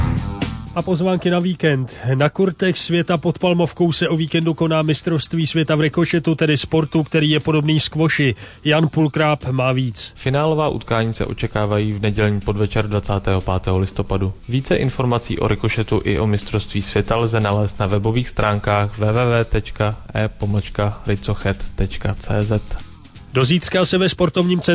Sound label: beat